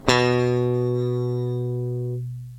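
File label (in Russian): гитара яркий звук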